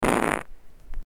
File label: fart prank in public